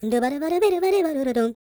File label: SCAT, Jazz